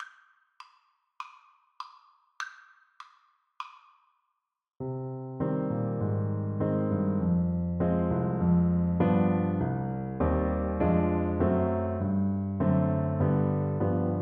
back to the hills trumpet

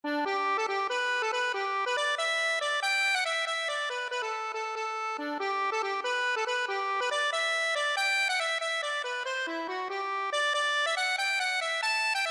doth not a meeting, accordion

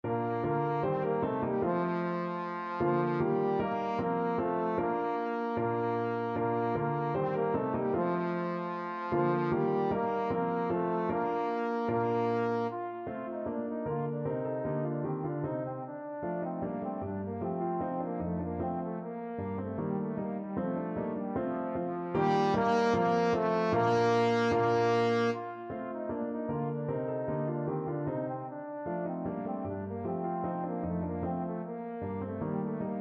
ding dong, trombone

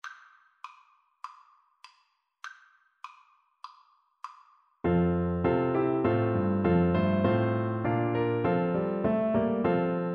Tuba

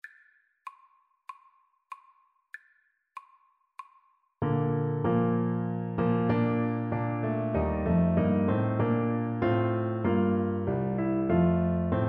Navy Hymn Euphonium

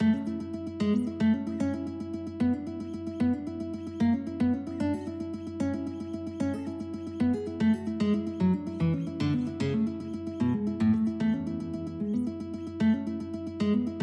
etude, guitar; carcassi

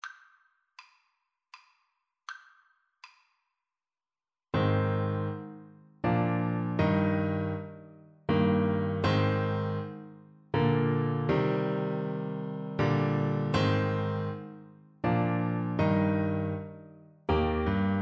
French Horn

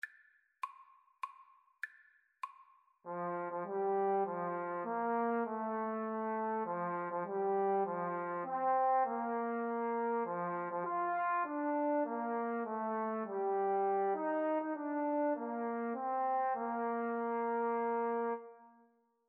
happy birthday, Trombone Duet

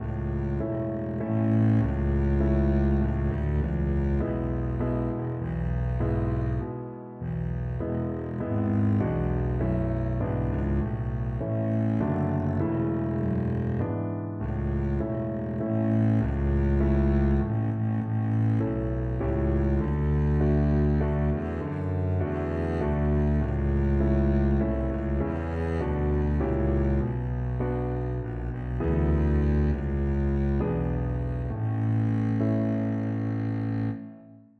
sing your way home, double bass

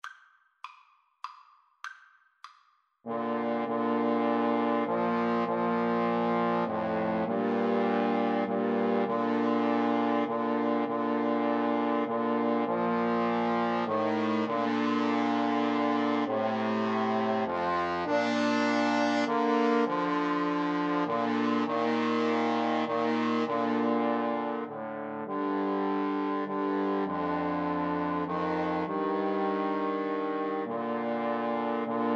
amazing grace, Trombone Trio

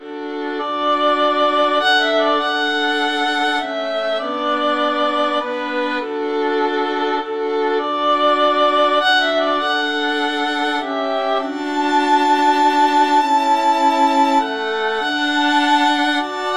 amazing grace violin trio

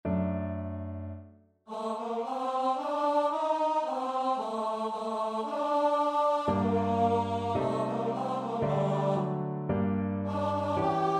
st matthew passion, choir; bach

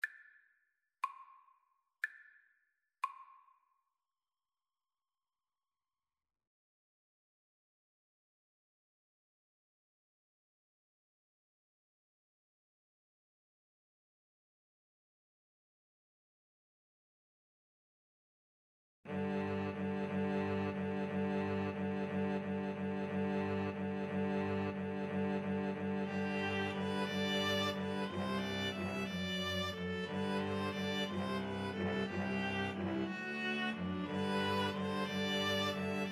String trio

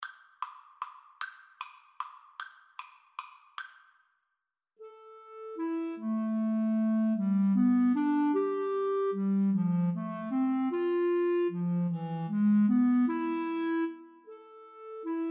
greensleeves, Flute Clarinet Duet

